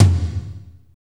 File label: TOM, Roland; Northstar - Drumscapes Roland